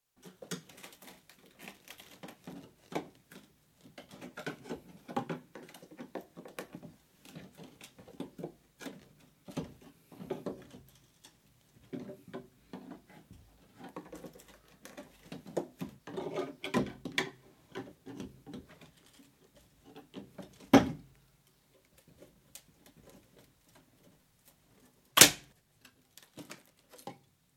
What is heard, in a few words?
Wood levered with chisel, splinters; Hand Tools (Sound Effects:Tools); BBC